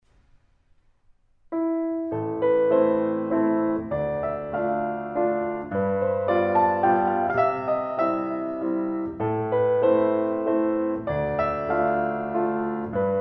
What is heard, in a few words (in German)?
Chopin Walzer A Moll